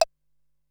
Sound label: Wood Block, Drum Machines Ensoniq SQ-R-Plus